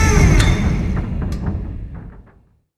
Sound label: door stop